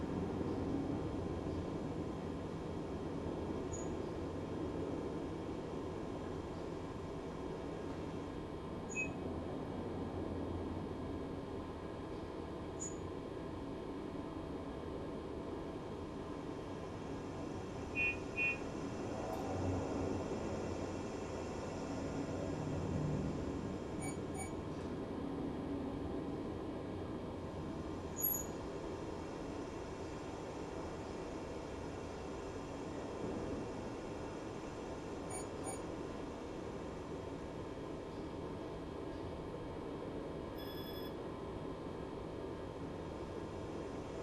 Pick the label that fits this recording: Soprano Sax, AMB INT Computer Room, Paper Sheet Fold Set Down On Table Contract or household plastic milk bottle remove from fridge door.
AMB INT Computer Room